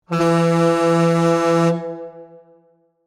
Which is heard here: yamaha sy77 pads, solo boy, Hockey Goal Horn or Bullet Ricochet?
Hockey Goal Horn